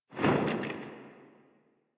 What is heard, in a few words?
Freedom Thrower Stop; Warframe; Caliber Chicks minigame; weapons; player